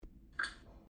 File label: floppa meow